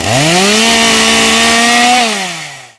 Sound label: chainsaw rev; zombie; cs16; cstrike; basebuilder